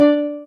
soft hitwhistle; osu

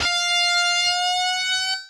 bend F6

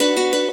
guitar

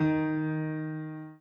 Piano Chord

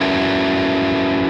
Arp.

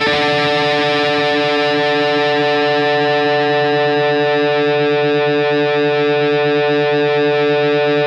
solo Arpeggio down

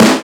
Snare crunch

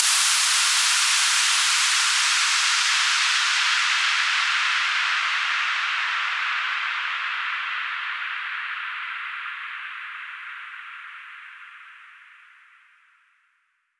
exhaust

